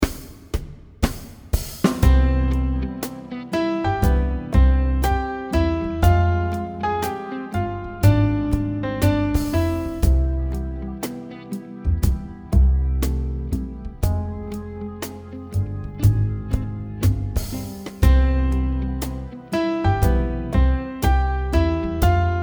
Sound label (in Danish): Imitation harmonik C; In the next two bars, Imitate - repeat - the melody on your instrument.; Imitation del 2 kap 4 opgave 1